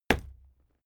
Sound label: fight impact sweetener bright; krieg-waffen; nahkampf; soundpool